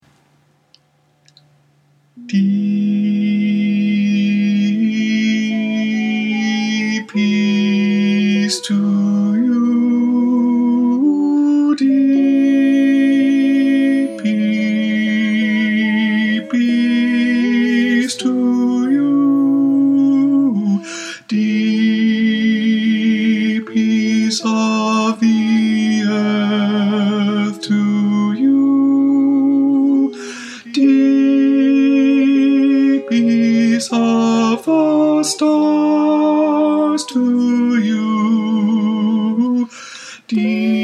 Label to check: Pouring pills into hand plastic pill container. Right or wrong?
Wrong